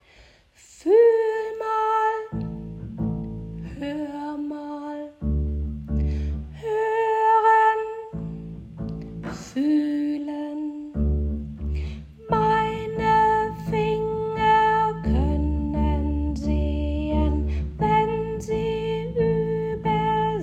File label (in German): Bass; Fühl mal! Hör mal!; pizzicato - gezupft